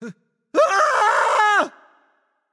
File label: fear; smite; voicepack; lancelot